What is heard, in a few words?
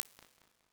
Imp Hall, Reverb